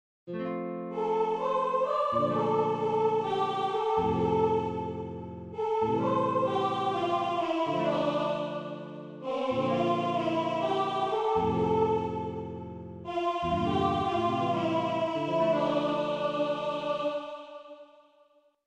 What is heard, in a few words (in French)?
Priere universelle de Paques; Messe de Verrieres